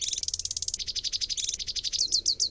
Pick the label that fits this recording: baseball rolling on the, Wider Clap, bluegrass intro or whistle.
whistle